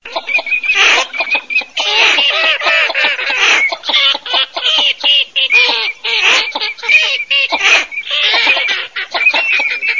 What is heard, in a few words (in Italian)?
ALZA MESTO MORIGLIONE